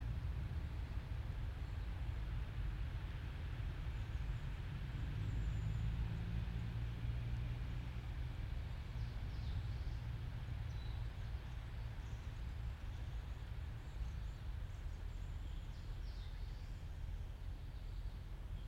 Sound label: yamanakako sound